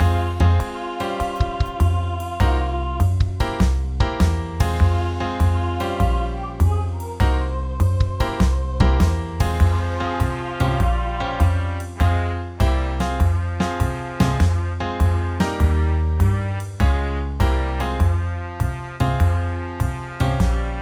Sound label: Eb Jazzy Song, brass